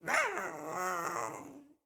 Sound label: Pet Speak; Catbrow; Pet Ship